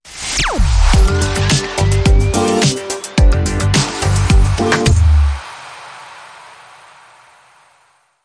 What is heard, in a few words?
Vox Radio